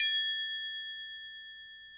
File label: Crotales, Orchestral Perc